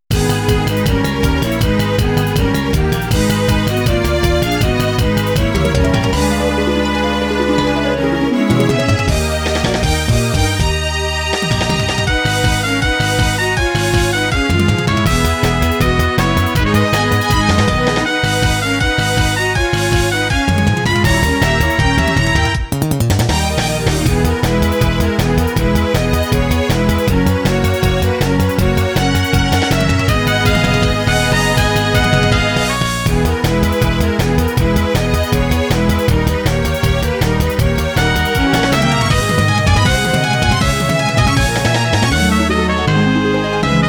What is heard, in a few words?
battle; roma2